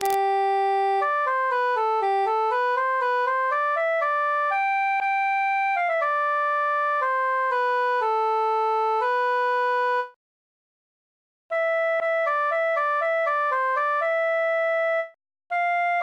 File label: nightingale cantus solo